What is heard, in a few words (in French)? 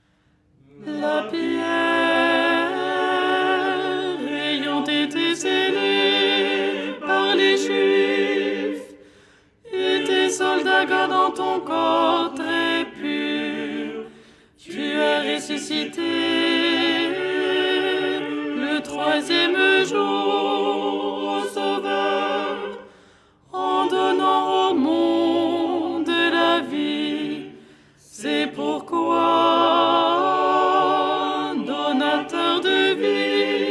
alto